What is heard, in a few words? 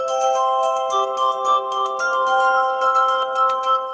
bell sparkle; sound effects